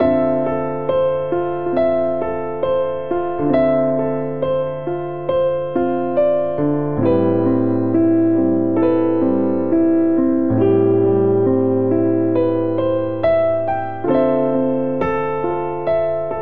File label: Progression Arpeggiated Melodic iv - i A Minor 68 bpm, Grand Piano; MIDI Clips; Ableton; Factory Packs